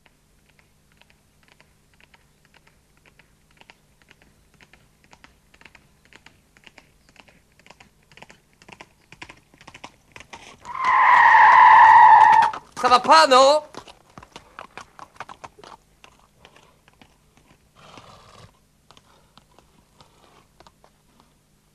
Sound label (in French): paysage sonore